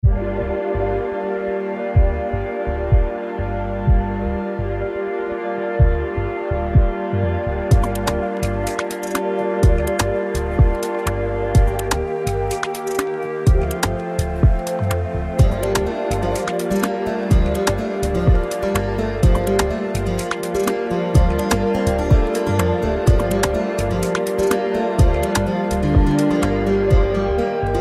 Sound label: House Music